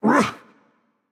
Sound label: grunt jump; smite; tyr